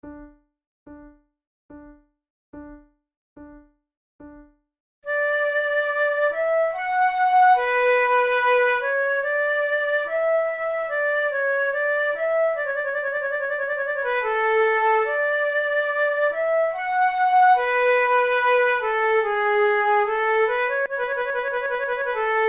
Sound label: sarabanda, flute